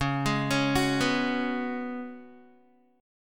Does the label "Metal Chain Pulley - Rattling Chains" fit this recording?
No